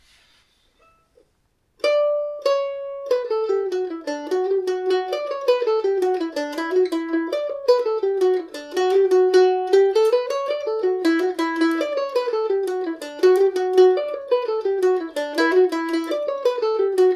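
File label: D major, irish mandolin; Garryowen; Kentucky KM1000 mandolin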